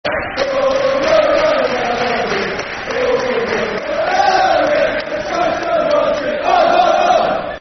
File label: Glory Glory Brighton And Hove Albion, Football Chant (Soccer Song); Brighton Bhafc